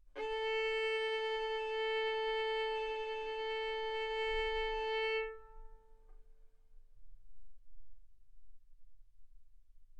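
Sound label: Violin arco mf A string A4 B4 stereo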